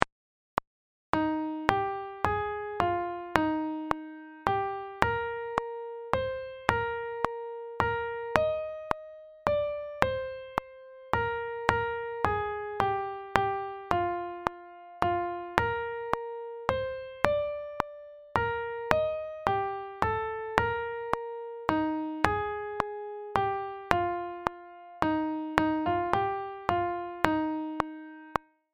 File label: melody metronome